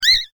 CRY, Crying Baby

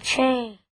chain

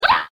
kill Chicken

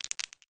DICE

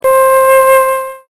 flute

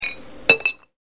dishes

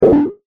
gorilla

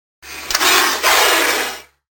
snake

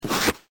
SUCTION

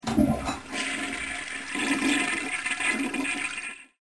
residential

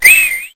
whistle

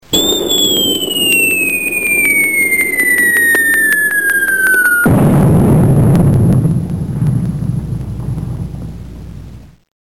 Firework

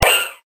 chimp

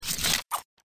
change spin

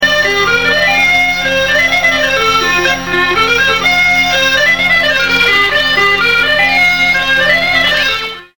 bagpipe

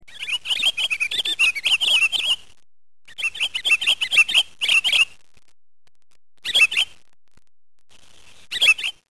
Pine Grosbeak sound

